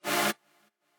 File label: chord